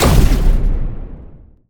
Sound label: emp blast; Mindustry